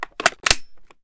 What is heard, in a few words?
reload mag in, weapons; gmod; viper